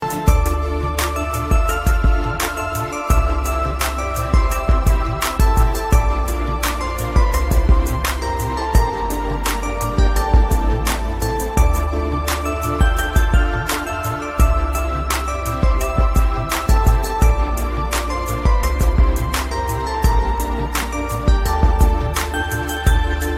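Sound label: heart touching instrumental ringtone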